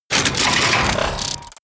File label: robot transformation metal